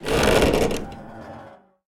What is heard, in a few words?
plane metall stress